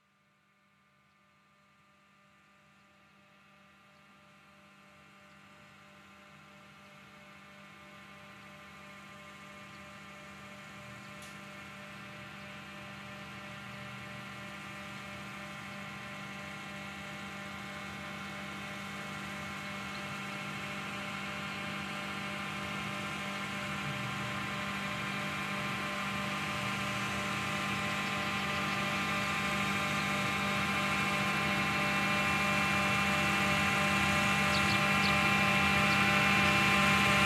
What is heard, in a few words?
siren; pila zkouska